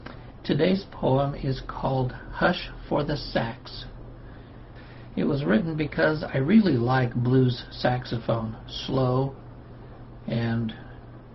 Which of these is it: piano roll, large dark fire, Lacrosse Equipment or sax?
sax